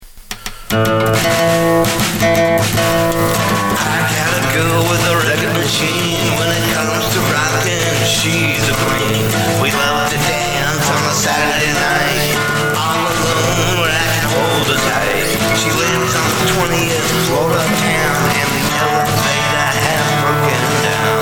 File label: old rockabillie cover